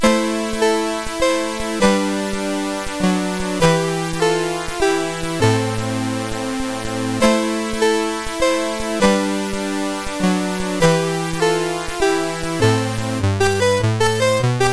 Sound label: CSG8580; CSG8580R5